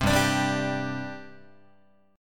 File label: G Suspended 4th G down Guitar; strummed; JGuitar